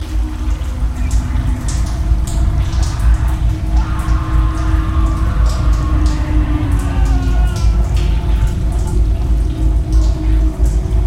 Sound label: pads; Muzik 4 Machines show 4 sept 2008